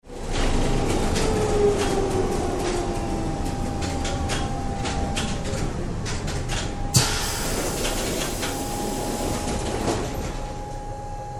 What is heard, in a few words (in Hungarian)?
stop door open, villamosok zorejfelvetele